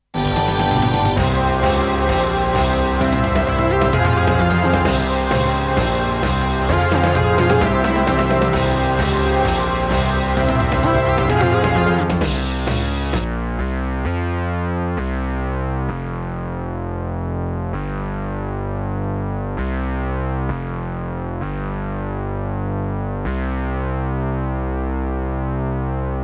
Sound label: vco bass mix